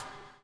BUBBLE; Spectrasonics - Backbeat

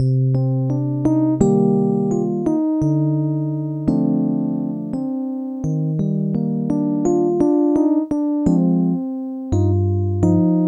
Rhodes

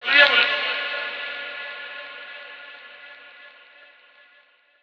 Fx Vox; Equipped Music; Loungin House

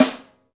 St Snare, Roland BK-5; Snares

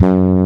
SYNTHESIZER, ANA HORN; AKAI S6000 CD-ROM - Volume 1

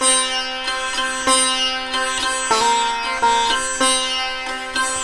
SITAR, Indian Traditions

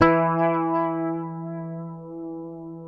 Fingered bass E3 R; Sampleheads; Will Lee Bass Library

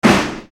Percussion, EuroDance

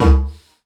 Didgeridoo Kit Samples

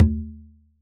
fist B, congas tuned; Q Up Arts; Denny Jaeger Private Collection

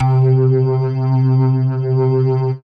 DM ORG1, Dance Mega Synth

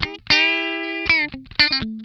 DAMPED FILL, Funk Guitar; 90 s Sample CDs; Zero G

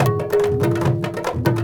INDIA L; Spectrasonics - Heart of Asia CD2

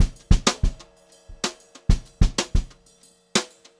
Brushed Drum Loops jazzy 126

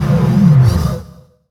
DANCE FX

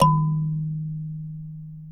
D#2 kalimba; WorldSounds

